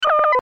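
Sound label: authentication failure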